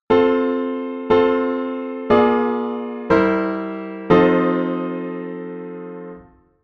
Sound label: authentic I IV V, 16 edo; 16 edo theory